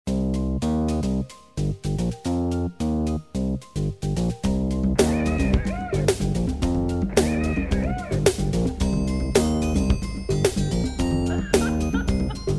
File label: drum